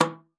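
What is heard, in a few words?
E3, bass flute; Xsample VOL-2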